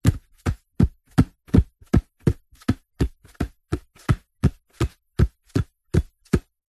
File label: sport rugby ball throw from hand to hand